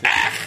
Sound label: ECH